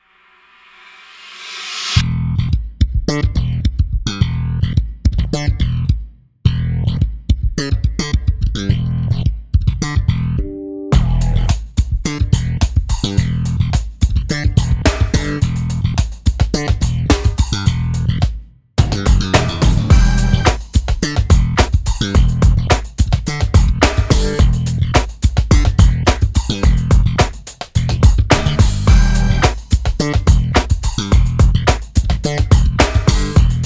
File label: Big Slap Groove; Trilian